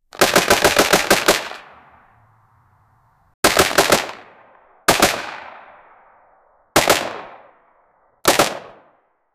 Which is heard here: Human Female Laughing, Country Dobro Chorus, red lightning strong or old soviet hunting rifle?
old soviet hunting rifle